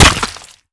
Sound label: bullet flesh head; gmod; viper